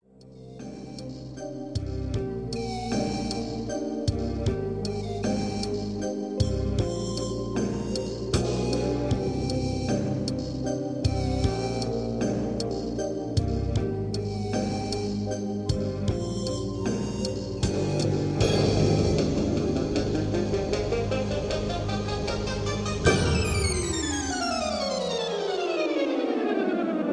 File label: SPACE